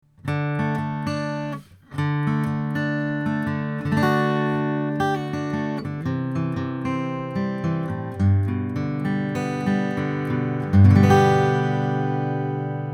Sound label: Oktava MK-117 Acoustic Guitar 20cm Picking